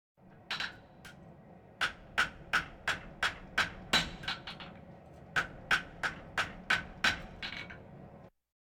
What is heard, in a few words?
Animal effects snorts grunts farm ambience